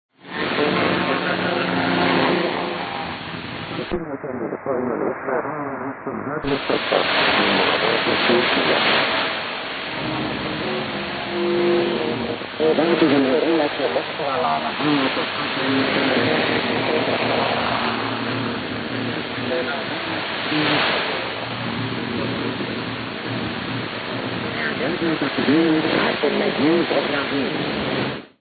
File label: 9305kHz Radio; Radio Cairo